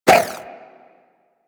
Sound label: Inf Throwing Star Whoosh, Infested; Warframe; Weapons